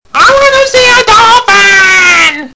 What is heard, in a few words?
dolphin; I WANT TO SEE A DOLPHIN; Japanese girl threw a temper tantrum, stomping her way along the dock and shouting